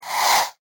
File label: slice